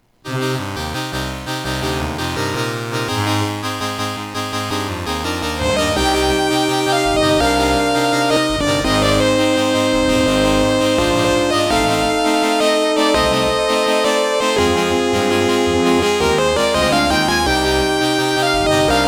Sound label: Intro SB OPL3SAx